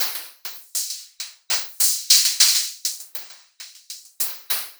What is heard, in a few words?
perc 100 spectral hats, Percussive Loops 100 bpm; Sample Magic - Transmission-X